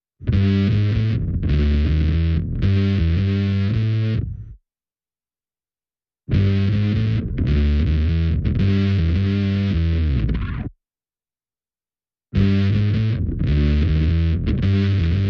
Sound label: Bass Only